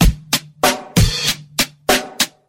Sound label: Drum loops Boombap